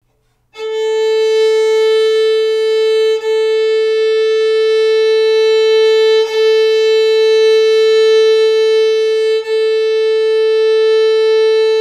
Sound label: sound of open A string, violin; Tuning the violin for Beginners